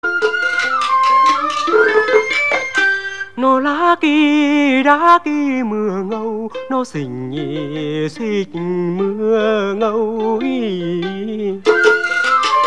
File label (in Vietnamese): hát chèo